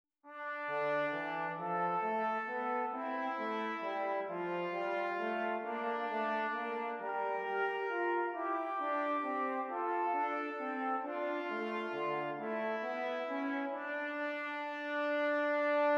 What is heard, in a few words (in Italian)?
Esercizi di contrappunto a due voci; Zwete Gattung; Ronge pagina 65; Correzioni